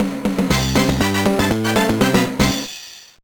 Jingle; Disney's Party 2003; GBA

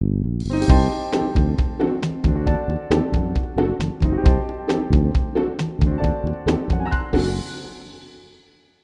Bari Sax

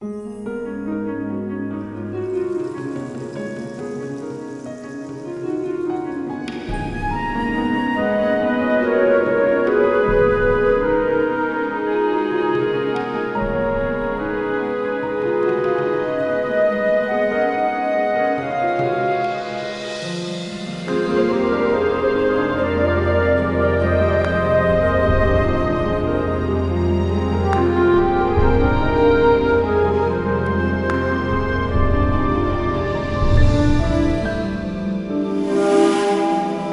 soothing

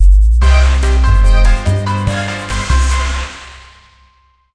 Win

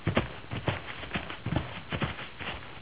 Walk; Five Nights at Freddy's; FNAF 2

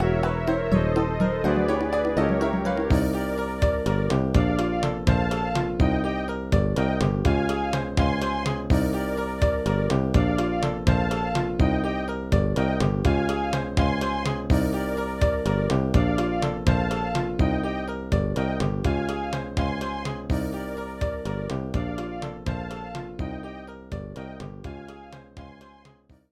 Bogey (Fanfare); Mario Golf Toadstool Tour

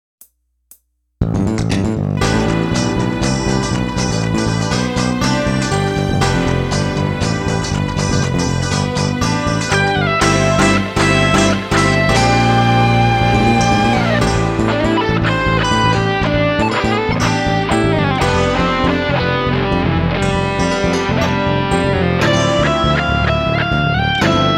Drum Minus Track

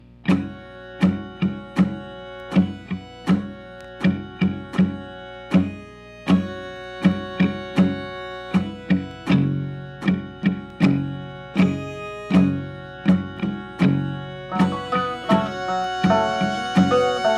River Blues

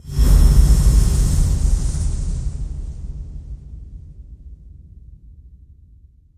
Swell; friday the 13th killer puzzle

